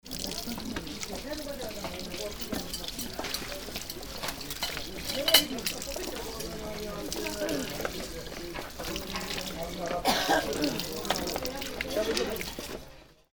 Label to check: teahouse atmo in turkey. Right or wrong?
Right